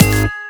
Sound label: stop bonus; Amatic; Fruit Box